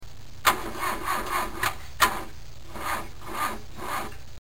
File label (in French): En panne